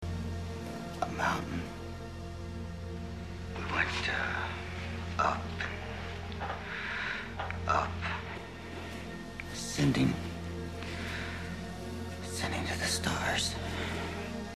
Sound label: ascending